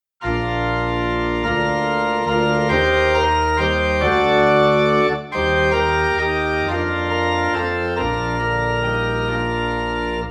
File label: Organ in B Flat